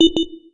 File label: Timber, notifications